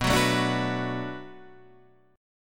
B Minor 7th B, chord; Bm7; strummed; 7,9,7,7,7,7; JGuitar

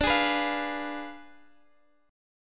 D Diminished 7th Guitar DADGAD, chord

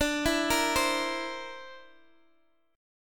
D 7th Suspended 2nd Sharp 5th, Guitar, Open G

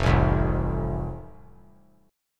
F Major, F Chord; strummed

